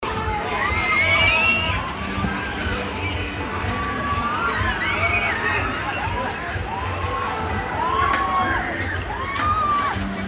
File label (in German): karussel hafengeburtstag